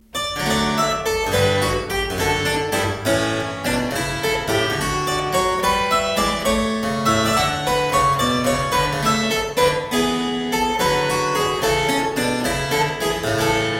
Suite no 6 in E Flat Major - Gigue, spoken; Johann Mattheson; The Twelve Suites of 1714

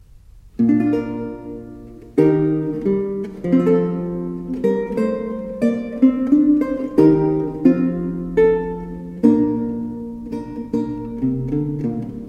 Tiento del segundo tono, Lute; Alonso Mudarra

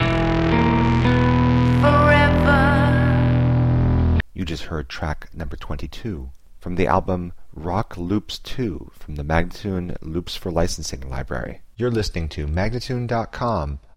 114 E spoken, Rock Loops; Loops For Licensing; MagnaLoops

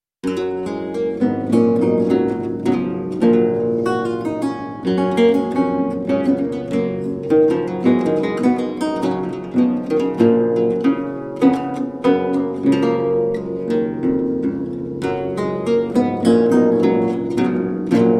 Sonata G-Dur Minuetto, Lute; Sonata 11; Rudolf Straube

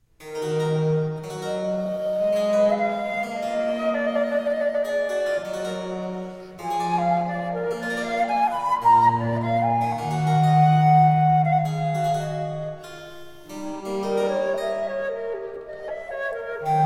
Sonata in E minor for flute continuo - Grave; Hallensersonata No 2; George Frideric Handel

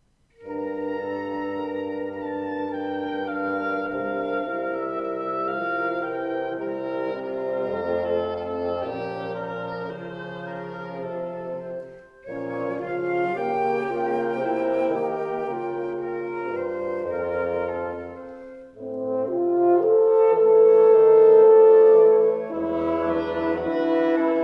Prelude, wind quintet; Prelude no 1; Ivor Gurney arr Christopher Britton; New arrangements for wind quintet

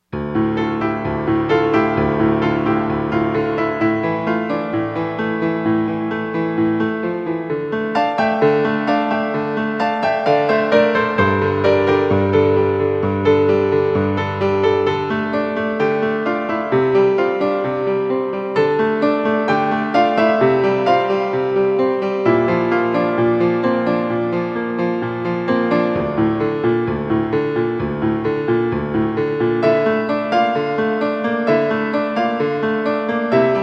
Prelude in D Minor, Solo Piano

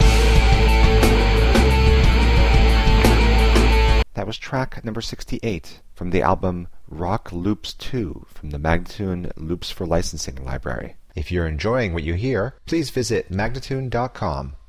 164 A spoken, Rock Loops; spinecar; Loops For Licensing; MagnaLoops

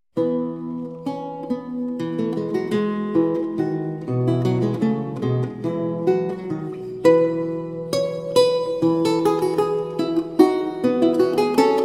Recercar, renaissance lute; Paul Berget; The Siena Manuscript

